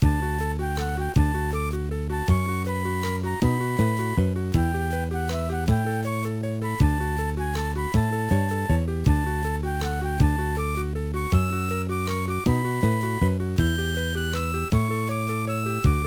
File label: BGM; Kunio-kun Nekketsu Collection 1; GBA